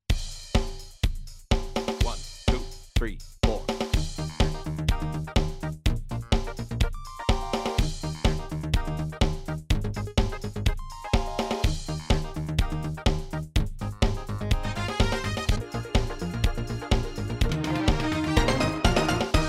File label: Tongue Test Eb @ 124